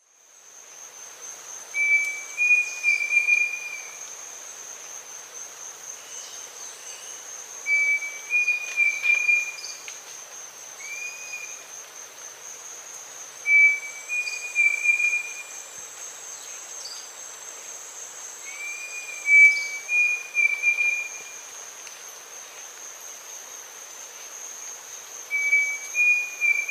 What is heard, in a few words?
Dromococcyx pavoninus, song; S. America; Eastern Lowlands